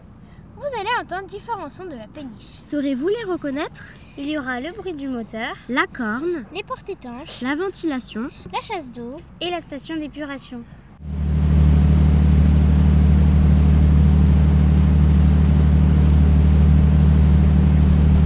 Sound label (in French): sons peniches